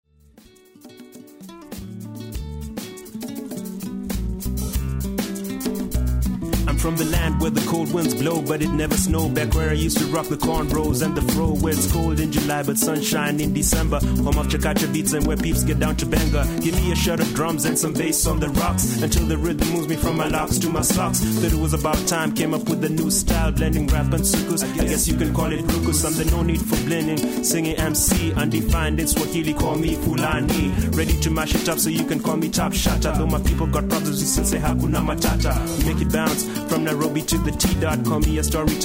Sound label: cha cha cha